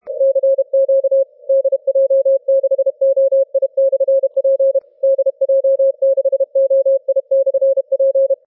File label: 30m cw